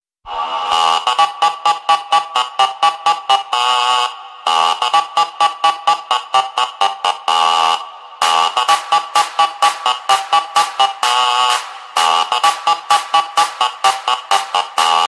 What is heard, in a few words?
trumpet short, soundboard